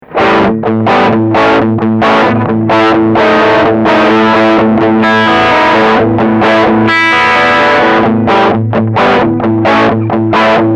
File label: Medium Gain